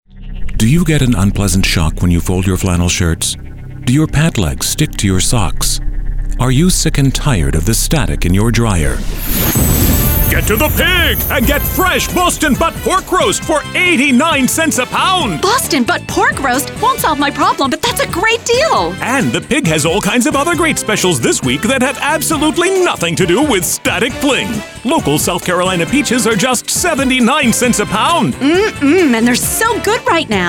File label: Static